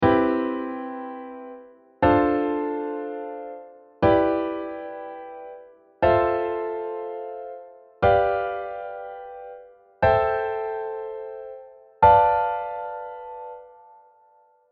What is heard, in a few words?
natural minor chord